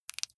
flesh wet greasy squish

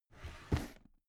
drawer cabinet carton open heavy halfway hectic

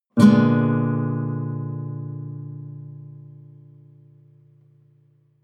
nylon guitar chord full diminished

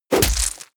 heavy punch gore short

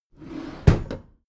packed drawer smooth close regular halfway

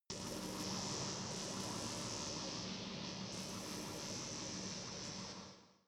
space inside biomedical alien shuttle static

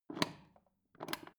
foot switch turn on off slow

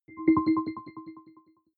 button echo chord arpeggio

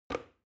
plastic button start push regular

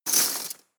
cutlery teaspoons drawer grab hard

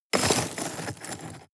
shovel dig impact

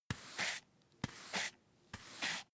broom hand sweep dustpan sequence

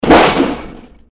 glass smashing

